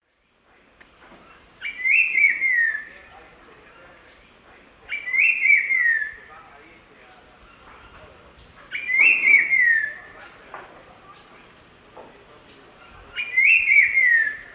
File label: Saltator grossus, song; S. America; Eastern Lowlands